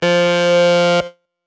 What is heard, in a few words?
Lead - F; Instrument Oneshots; 99Sounds; Monosounds